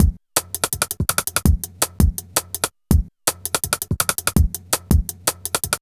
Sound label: Stick Break 165, Drum Loops, Jungle; Jungle Warfare 1&2